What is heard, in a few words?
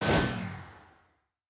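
sword counter wait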